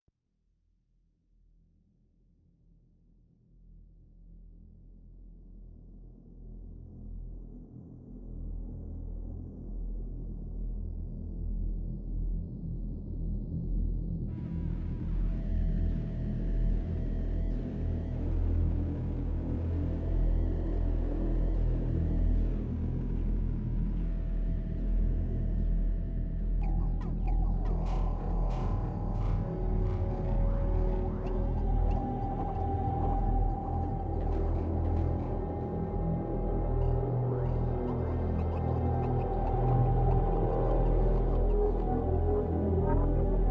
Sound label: Ambience